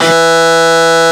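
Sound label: Distorted Guitar E1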